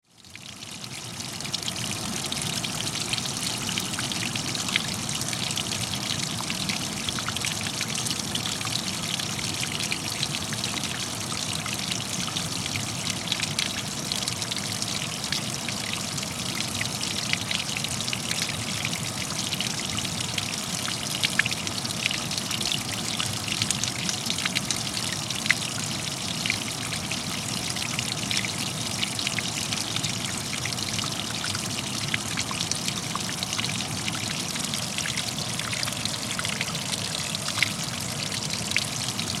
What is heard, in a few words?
falls stream; mystic